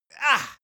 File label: male exert; soundpool